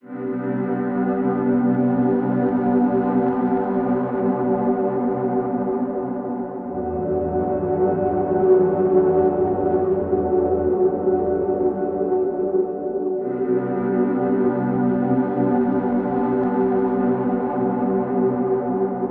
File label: pad progression, ambient